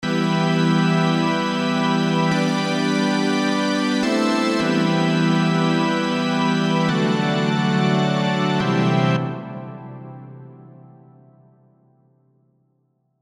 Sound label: Synth